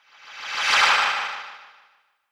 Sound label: reveal chime